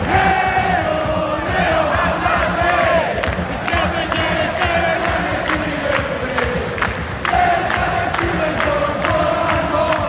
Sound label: players chant; reo hatate; celtic